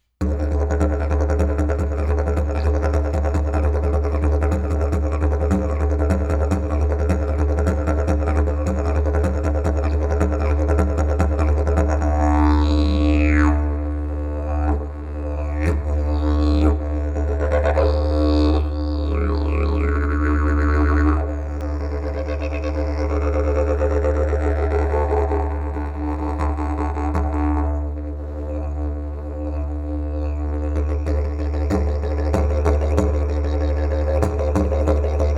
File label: didge